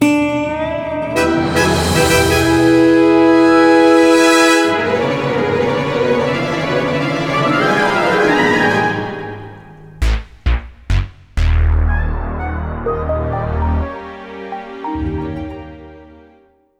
MiniGame Zombies Lose; DreadWood

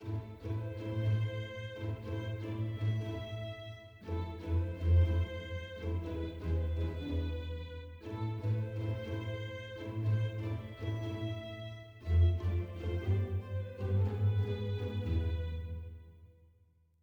Heroic Soft; atmasphere windows gamerip 2018

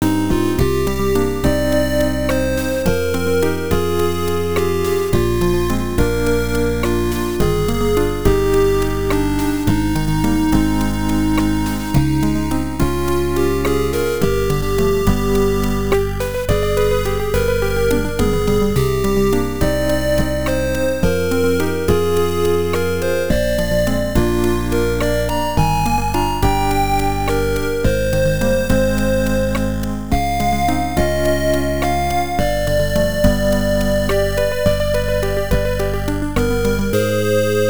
Tension; Ys IV The Dawn of Ys; PC Engine Super CD-ROM2

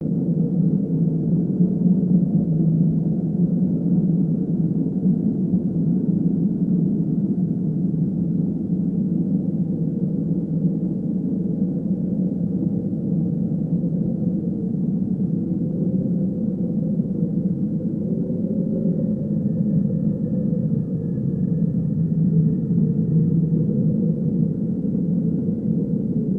Fog Of War Bed; Civilization V

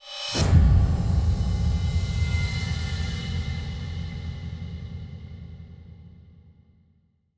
Stingers sting chance fail

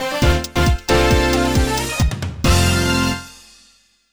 superb (jingle); Heaven Studio

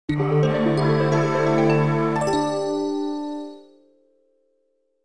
Logo; Sega

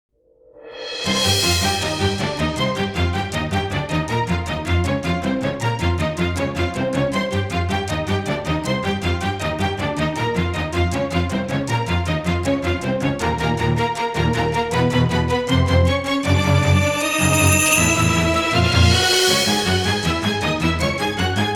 Tension; God Wars Nihon Shinwa Taisen

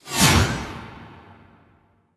Spell Shot; Level16 Veznan

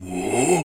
zombie guard club prepare

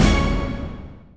Combo Even Hit; Duel

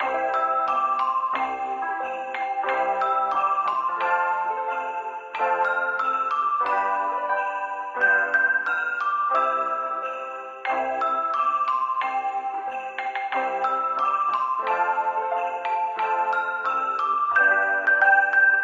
Cafeteria Xmas Jingle Bells

